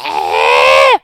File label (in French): alarme